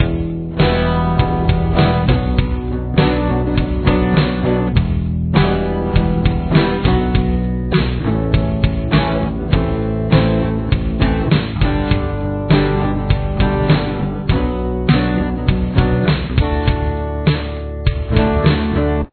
solo backing, guitar; backing track

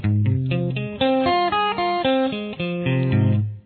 Arpeggio Dm style, guitar

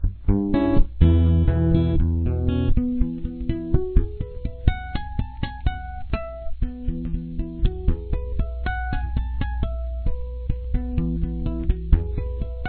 outro

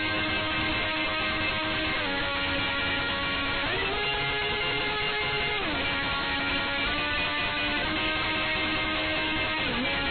guitar chorus

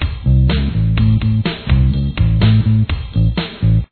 main bass

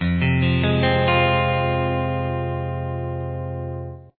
E style barre Fm; guitar

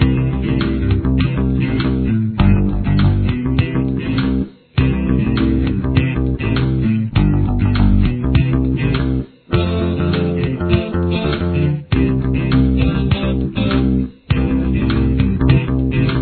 interlude

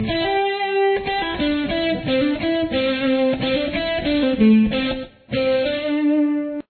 lick, guitar

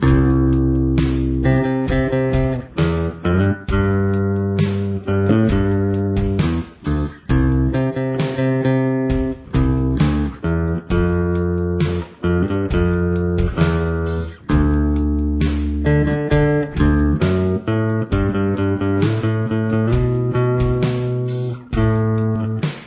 verse bass

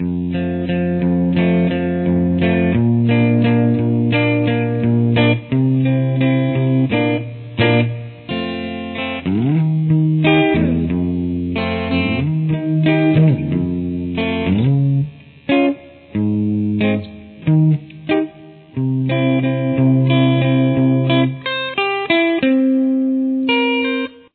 chorus, guitar

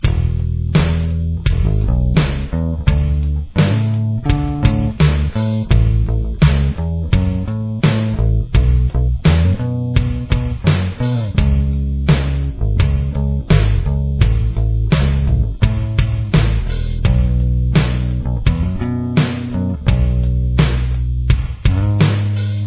bridge verse

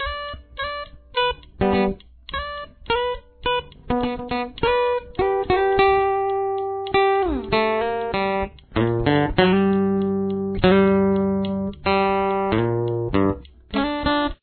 solo

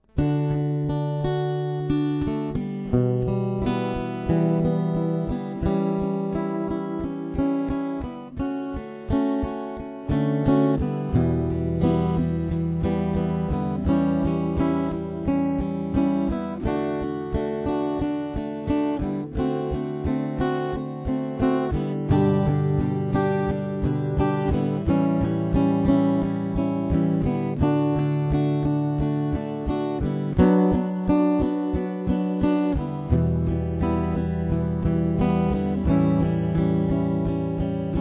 verse, guitar

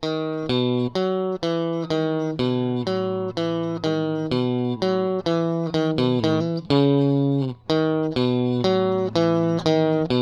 interlude

